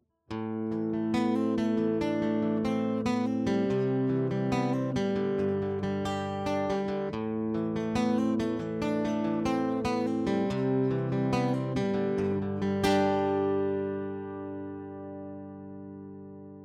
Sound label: acoustic martin d 28